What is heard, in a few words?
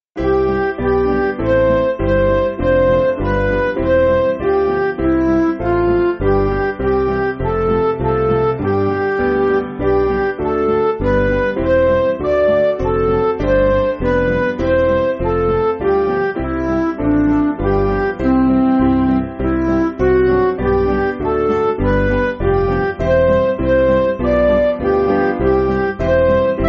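Midi, Music Backing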